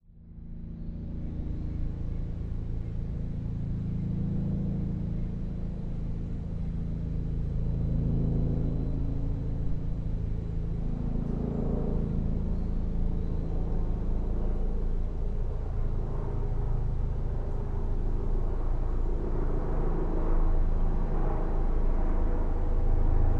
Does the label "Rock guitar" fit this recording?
No